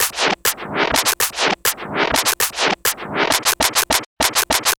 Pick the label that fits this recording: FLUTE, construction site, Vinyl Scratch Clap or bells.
Vinyl Scratch Clap